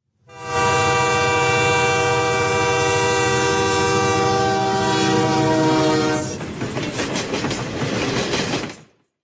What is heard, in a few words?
Train Horn Sound